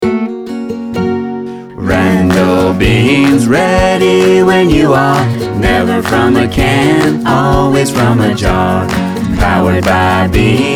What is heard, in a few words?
Jingle With Claps; 16-bit